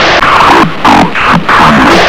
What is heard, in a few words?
zombie idle; svencoop